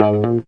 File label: disco guitar notes